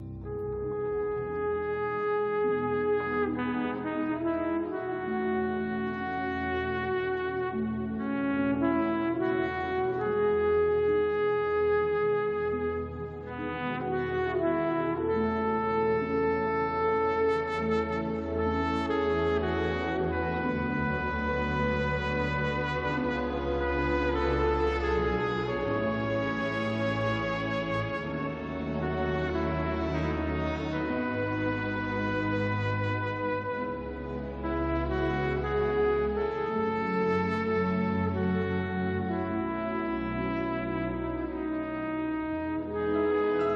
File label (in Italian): TROMBA